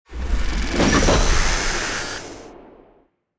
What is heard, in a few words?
Vow